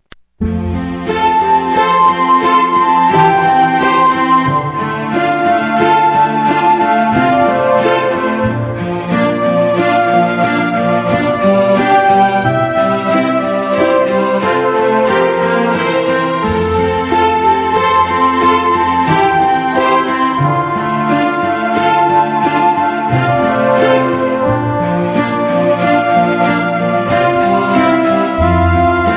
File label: Classical Cue